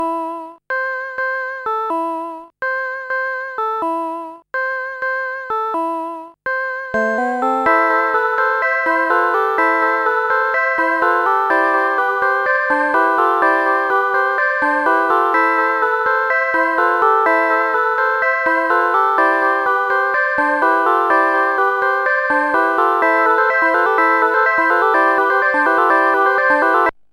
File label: organ shorties; MusicLine